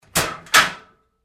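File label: Door Locker Open Close Up Hard, Metal Door; Doors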